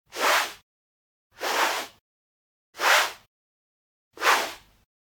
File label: Generic Slide; boom library; The Interface